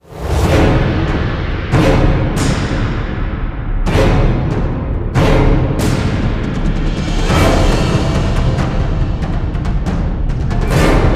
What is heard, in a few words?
Combat Long